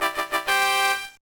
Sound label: Jingle; Ex-Monopoly Soundtrack